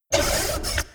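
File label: construction progress, ui; Stellaris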